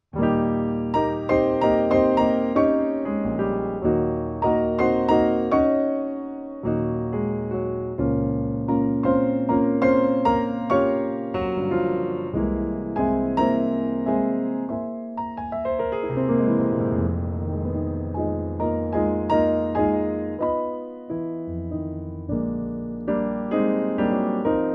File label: Cinepiano Classical Cinematic Rock; Michael Barry